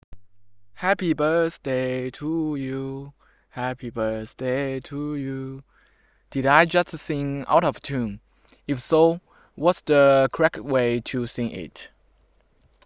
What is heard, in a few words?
sing